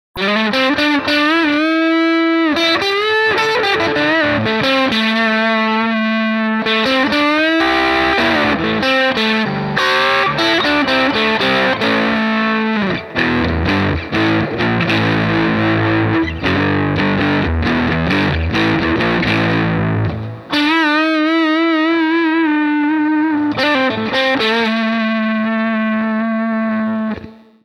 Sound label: Guitar Distortion